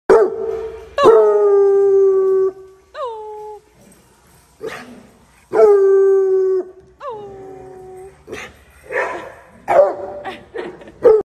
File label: Estonian Hound; تازی استونیایی